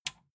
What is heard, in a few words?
metal button tiny release enable